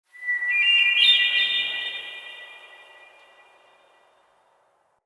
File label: Wetland Forest Hermit Thrush; Midrath Island; Ambience